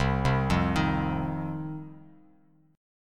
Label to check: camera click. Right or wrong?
Wrong